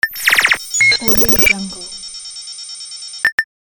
computer inventory

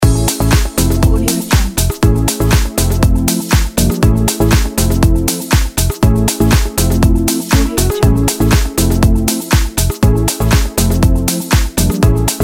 fashion house loop

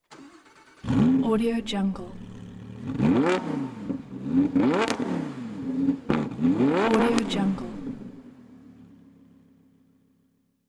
sport car exhaust acceleration

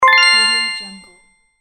win sound

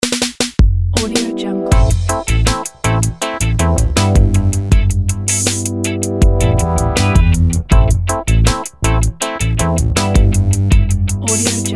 funky cruise; royiki-d

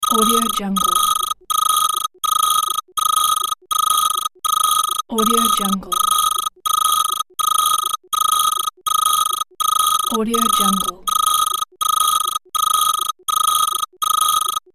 machine data process

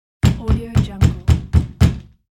door knocks

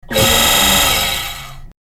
thruster quick reversed

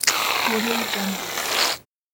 shaving foam

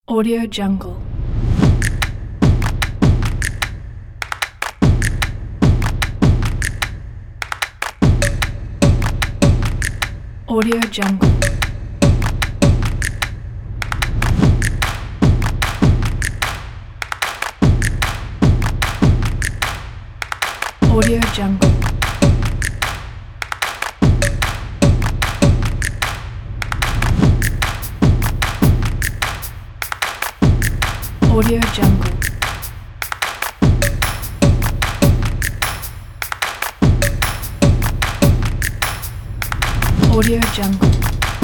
modern clapping drums rhythm pack

